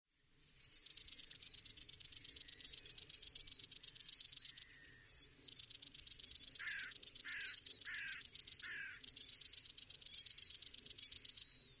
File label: great green bush cricket